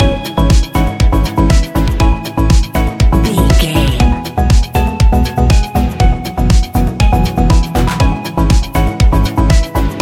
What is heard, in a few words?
beachside romance, A fast paced piece of club music; A fast paced piece of club music perfect for the nightclub, and a youth orientated visual scene.